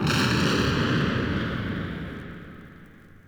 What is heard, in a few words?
explosion; Crash Bang Boom